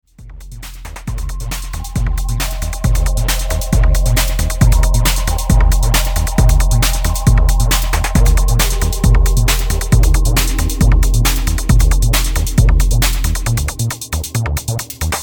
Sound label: Tone Percussion